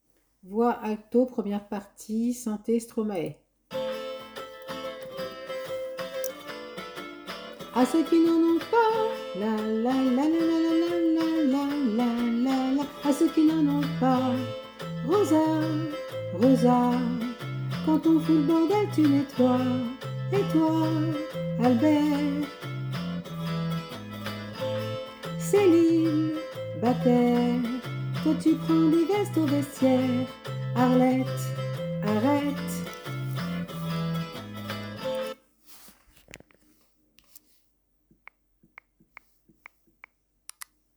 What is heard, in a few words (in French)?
voix alto